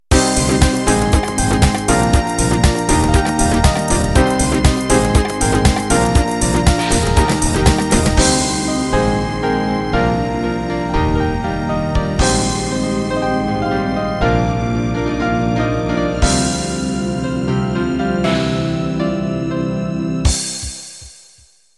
House End

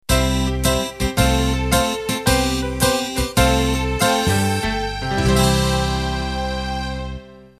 70s Radio Pop End

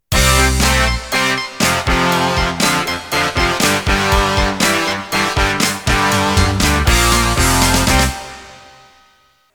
Open Rock Intro

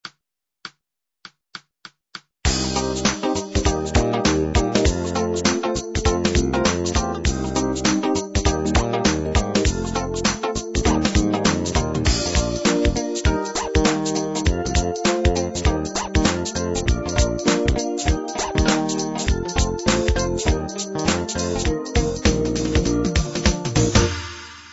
jazz hiphop

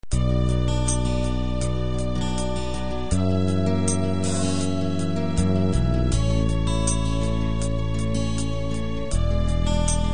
guitar ballad

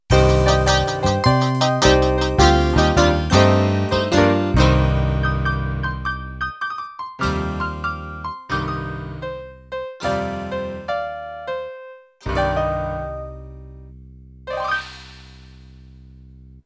Rockabilly End

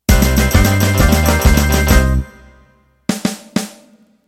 Country Shuffle Intro

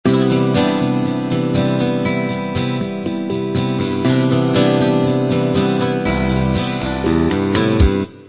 light rock intro

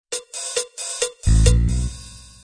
rock intro

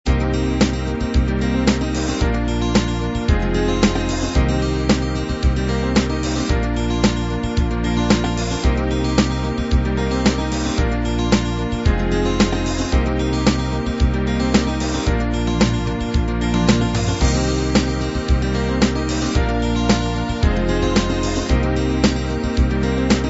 soft beat

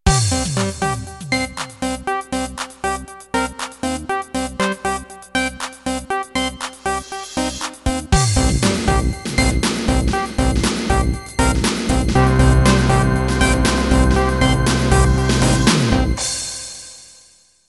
Dream intro

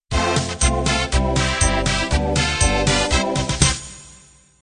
2beat 60-70s end

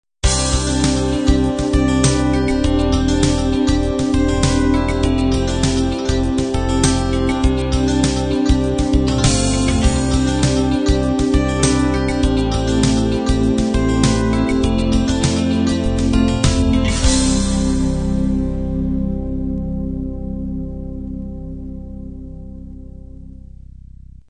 groove ballad end

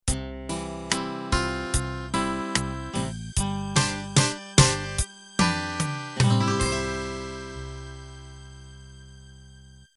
8Bt Standard End

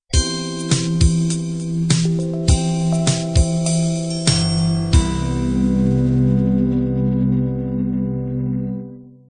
color beat end

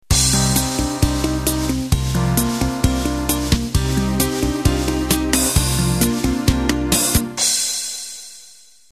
Pop Bossa Intro

